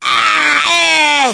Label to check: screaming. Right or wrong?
Right